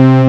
POWER SYN

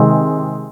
chord

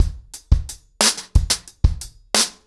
ACOUSTIC DRUMS, 90 BPM

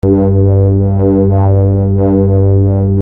Syn Bass 130 G, Techno Synth Loops; SynBs; 130bpm; Sonic Foundry (Sony Creative Software); Signals I

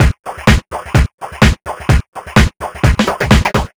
No Hat, Drum Loops 127bpm; Zero-G; Soundclash Nu Dance Electro; Acidized Wavs; Ugly Funk

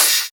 Open Hihats, Cymbals

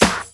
KIT, Dance Mega Drums, HIP; Best Service

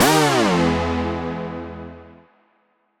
Poly Chord Hits, Ramp Down, E; future rave

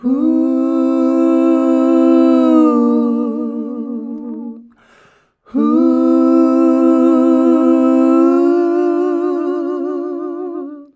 HUUUHUH, MALES; Best Service; Hyper Dance

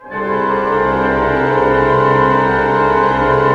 orchestra chord cluster 12-tone; Roland LCDP08 Symphony Orchestra

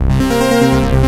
Korg, FX

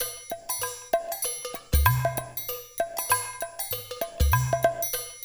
loop, Percussion

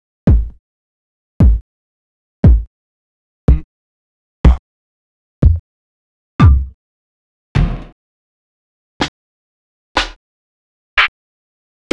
Vinyl BD SD; X-Static Goldmine

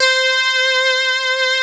CLAV LEAD C4, Analog Fantasies; ARP 03; USB Soundscan vol.49; AKAI

